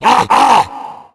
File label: Tank Spot Prey; cstrike; RA Zmsounds